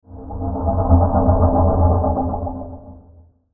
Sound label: SWELL PULSE, Swell Pulse Eerie Dark; Production Element Imaging Element Accent Transition